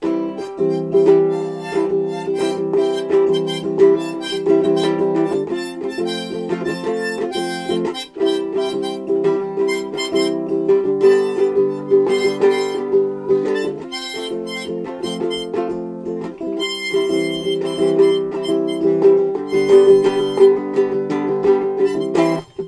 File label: more blues